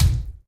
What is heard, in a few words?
bathtub step; Golden Crayon Sounds